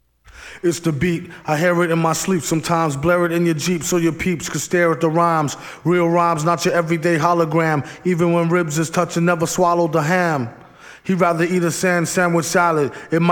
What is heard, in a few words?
Acapella